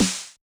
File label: Yamaha DJX 2B, SD T9 L